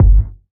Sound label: low kick drum sample g key, Royality free bass drum single hit tuned to the G note.